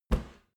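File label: step soft stomping, footsteps; schritte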